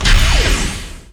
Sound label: Enemy Factory Summon Motion